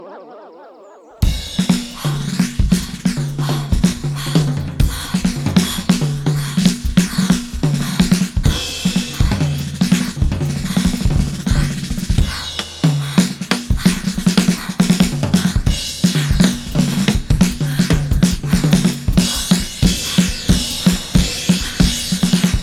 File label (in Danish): Løbetrommer